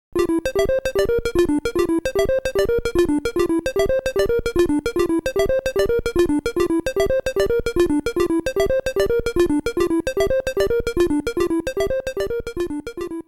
Enemies Dropping Sound ~ Eaten Sound ~ Restart ~ Clear ~ Perfect; Game Sound Museum Famicom; Balloon Fight